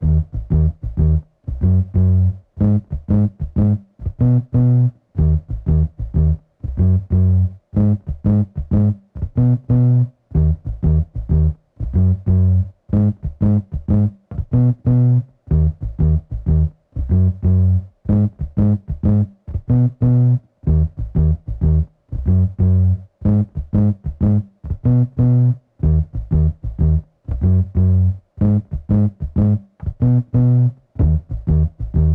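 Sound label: Slap Bass 93BPM D minor Dirty Distorted; Far Away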